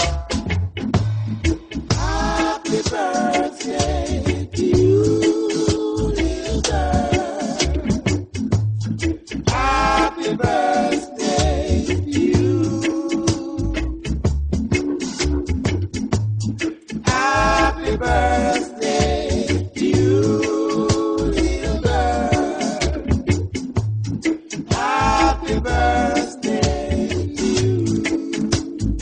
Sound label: Reggae Birthday